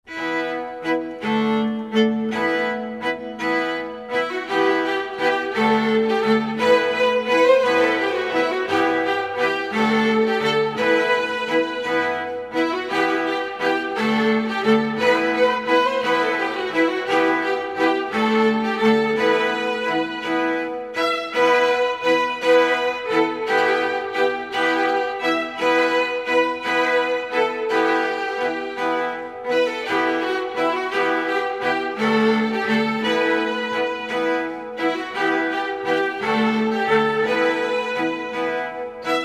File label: Scandinavian Xmas Dance